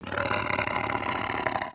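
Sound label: Sabertooth Idle; creatures; pets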